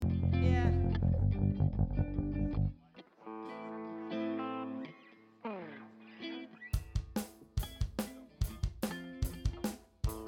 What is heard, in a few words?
Strings Mix